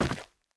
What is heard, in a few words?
Nomal Man Walk; Knight Online